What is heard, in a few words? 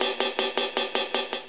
CYMBALE 160, Drum Bass; eJay